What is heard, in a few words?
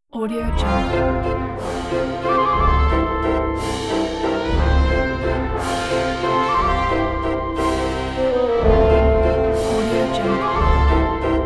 slippery carnival ident